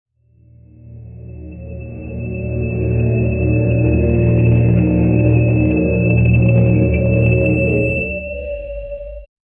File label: dark ominous and melodic, synthwave